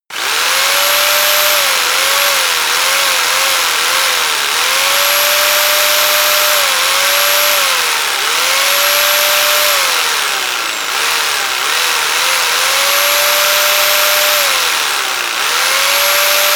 jigsaw engine sequence long; maschinen; saege

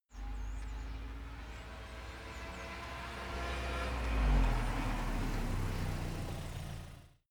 car arrive reverse gear

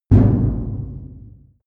medium metal impact

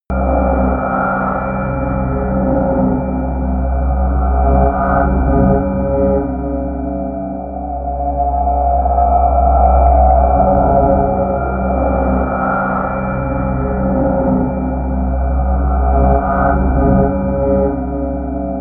drone sonar

